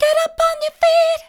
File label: get up on your feet soft, vocal